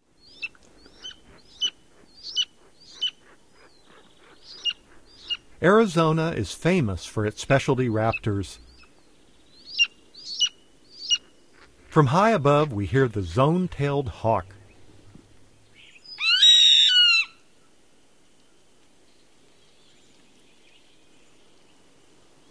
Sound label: Zone-tailed Hawk; North America; Common Western Species; Know Your Bird Sounds